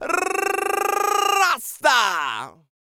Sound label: REGGAE, Vocal Planet World Voices